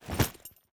shoulder; gmod; arccw uc commons attachments

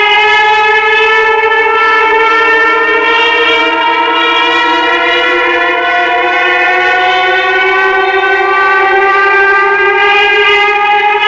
jericho, stuka; gmod; lvs planes

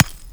sand impact bullet; surfaces; tfa starwars melee weapons pack; nmrihimpact

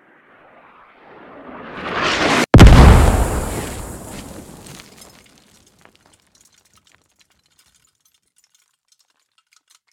mortar impact 50m; gmod; better mortar

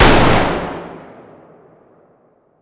fire dist int rifle; gmod; arccw uc commons attachments

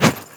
bat light; melee weapons; tfa starwars melee weapons pack

